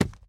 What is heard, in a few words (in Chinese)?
錾制书架插入声